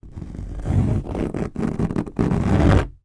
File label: wood hardboard thin scratch rub with blunt object slow long